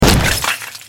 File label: hammer